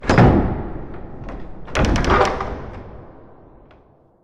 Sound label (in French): porte ouverture